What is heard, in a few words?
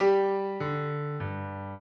trio, piano; Mozart